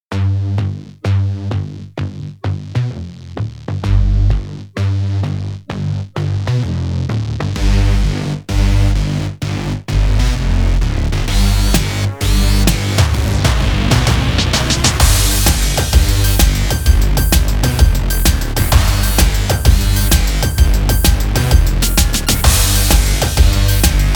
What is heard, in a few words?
(Instrumental)